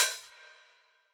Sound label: Open hat, retro house samples, Drum Hits